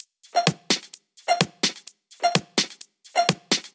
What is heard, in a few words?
Drumloops 128BPM